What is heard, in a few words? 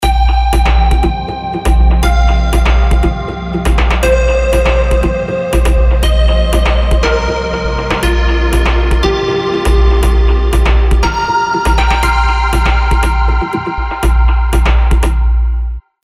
Spaces ringtone